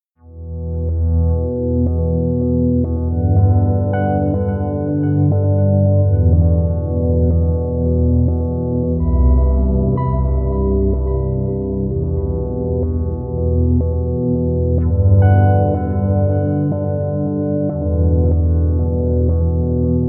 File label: Dark Composition; standard pack 2